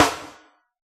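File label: hip hop kit snare; Dr 202 Kit 1; Percussion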